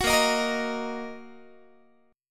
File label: A sharp Suspended 4th Sharp 5th A sharp, Guitar; A#sus4#5 strummed; 21,18,18,20,19,x